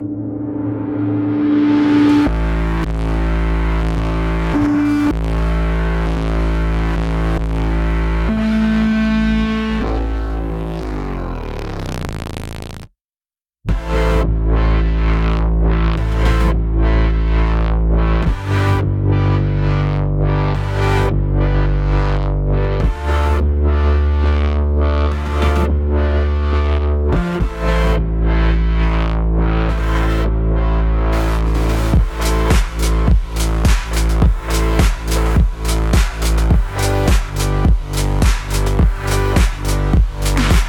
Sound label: Pink Noise; Focus & Concentration; Noise Masking; Pink Noise Dreams